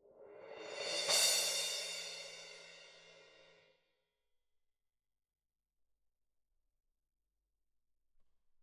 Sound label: Perc